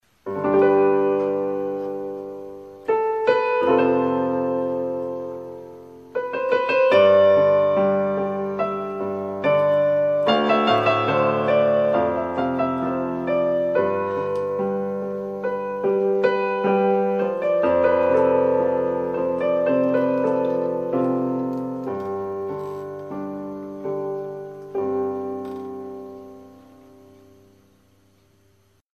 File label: Piano improvisation